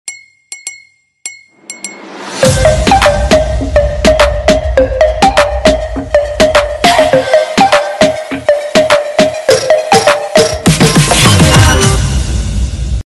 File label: bollywood ringtone